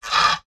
industrial lever metal large heavy short fast movement creak; zapsplat